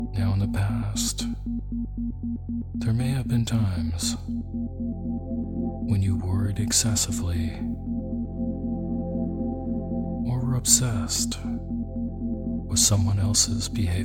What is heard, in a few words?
Detachment With Isochronic Tones